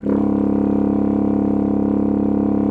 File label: BASSOON C#1; Xsample VOL-6